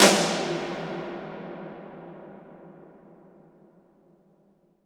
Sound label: toms, cinematic impact, drum